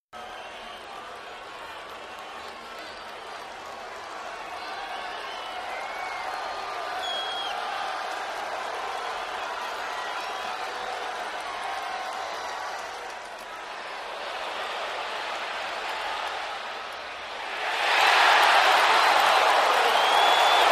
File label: Football Crowd Stea, sports arena football